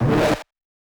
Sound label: distorto bits